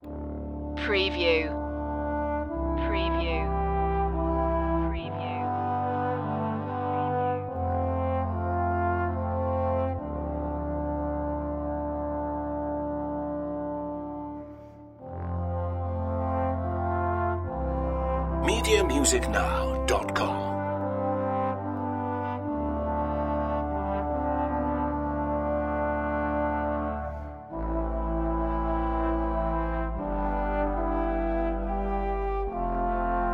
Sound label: brass solo